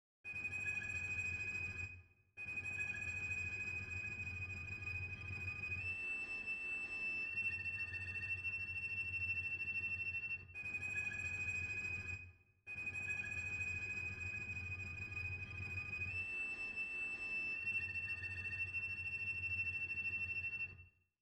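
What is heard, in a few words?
pattern, cello; devolution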